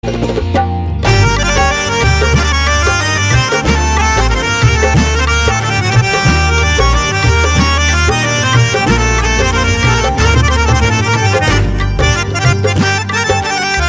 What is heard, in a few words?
Instrumental; Aameen 2.0